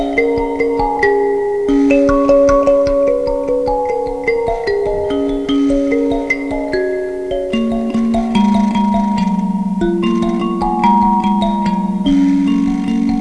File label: jbox, kalimba